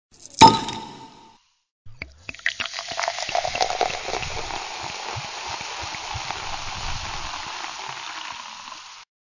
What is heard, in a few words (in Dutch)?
het geweldige geluid van de beugelfles; van grolsch